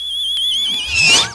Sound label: Magic; Majesty; OnlineGame